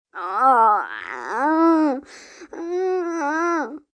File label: Moan Kid, Moan, groan, child, boy, whine/whimper, pain, cry, hurt; Groaning; VoiceBox